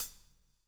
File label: COPS; Spectrasonics - Backbeat